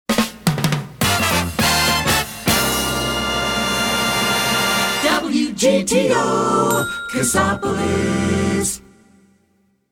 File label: Jingle; Short Attention Jingle Show